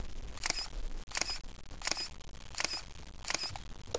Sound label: Canon EOS 350D Digital Rebel XT